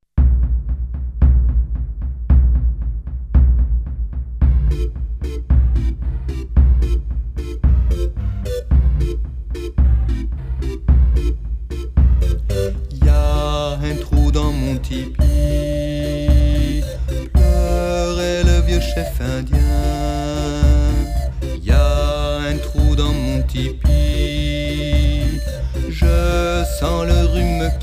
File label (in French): ensemble vocal variété jazz chant du monde